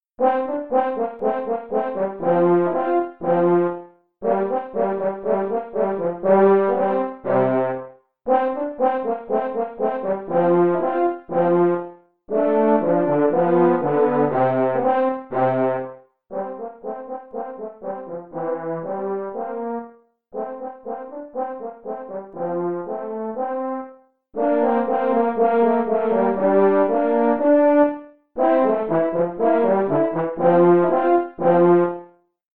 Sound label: Easy Classic Duets Gavotte, French Horn